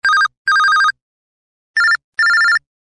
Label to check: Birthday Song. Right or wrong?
Wrong